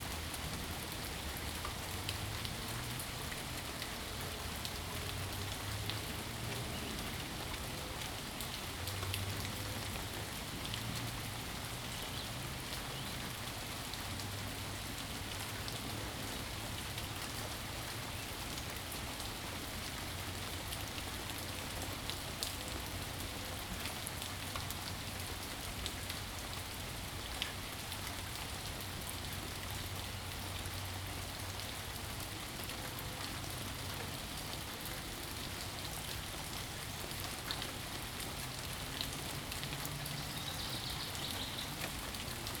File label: rain